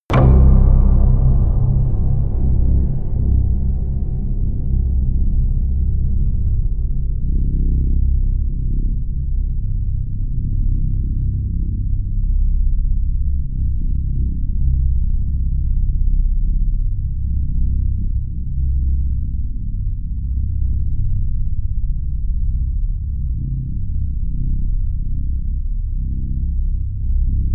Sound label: drone deep pick